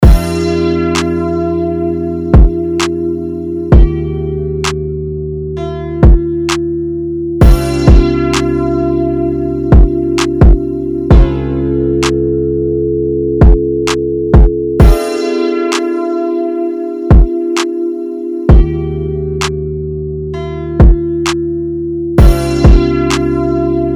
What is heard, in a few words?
beat